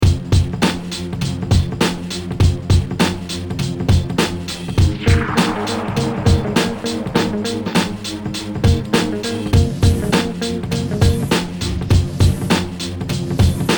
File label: background; space game